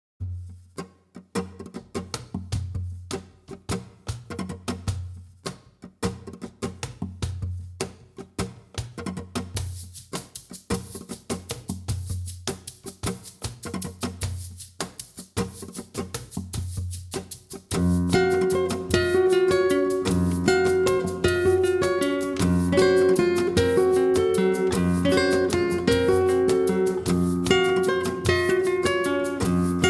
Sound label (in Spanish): Fandangos por Bulerias